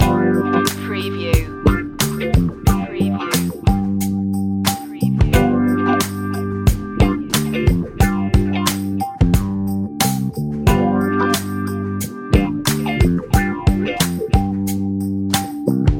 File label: warm funky jam loop